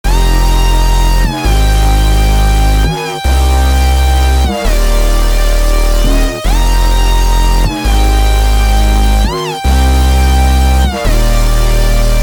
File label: Future Bass Drop Loop; Cymatics - Odyssey Future Bass Drop Loop 7 (Original)